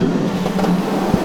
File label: Snare Drum; Percussion Samples